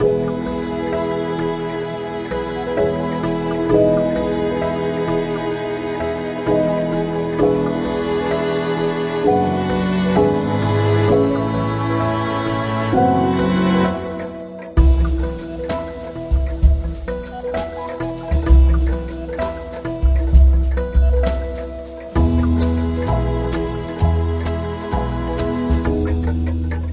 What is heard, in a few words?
Clock Tale Underscore